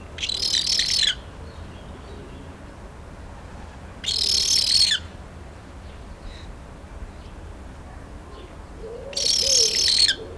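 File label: Eudocimus ruber juvenile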